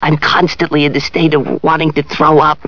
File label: throw up